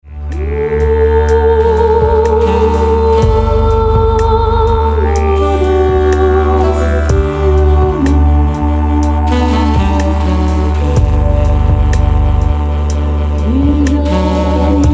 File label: chillout